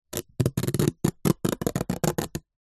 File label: foley plastic empty tub scratch sharp object; zapsplat